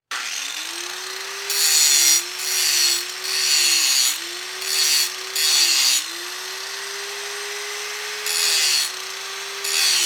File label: Industry Tool Grind Electric Grinder Small